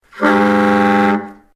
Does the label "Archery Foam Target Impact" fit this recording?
No